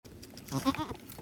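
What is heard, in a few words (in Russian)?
миниатюрная козочка с колокольчиком на ошейнике задумчиво зовет перекусить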